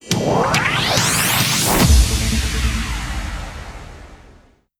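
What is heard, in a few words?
Battle 60sec Jingle; disney star smash android ios mobile gamerip 2020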